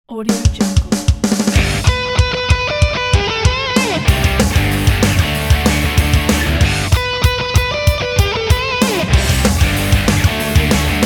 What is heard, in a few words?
american jingle bells